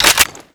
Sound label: famas reload chamber; foley; Call of Duty Modern Warfare